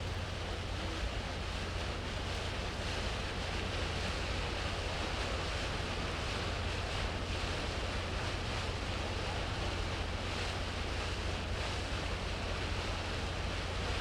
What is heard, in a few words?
ship sailing ambience; vehicles; NS Studios - ultra sound collection